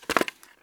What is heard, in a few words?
item helmet pickup